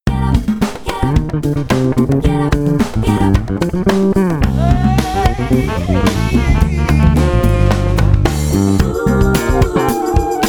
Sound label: groove